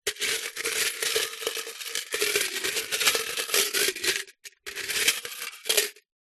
money coins inside metal tin hand inside movements; zapsplat